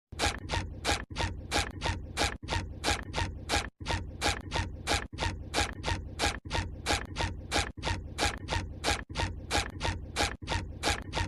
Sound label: walking sound effect; spongebob